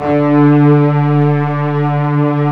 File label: MIDI CELLO, SYNTH STRING; Greytsounds VOL-1